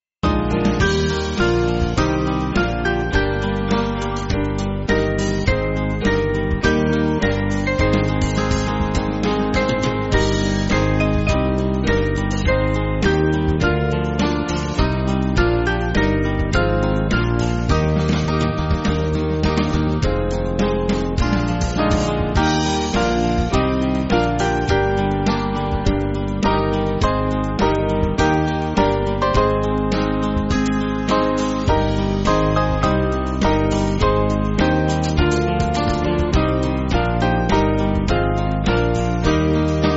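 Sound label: Band, Backing Files for Churches; Fair Waved The Golden Corn; Selma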